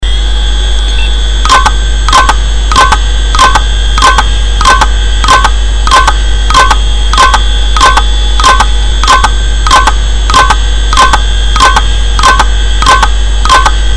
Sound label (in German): wd festplatte klackern